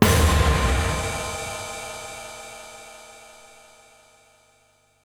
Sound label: Percussive Stab